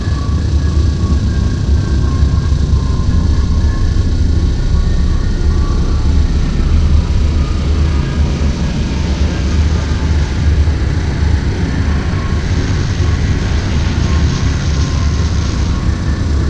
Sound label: reactor hellburn large; AA Dangerous Meltdown - EK Compatible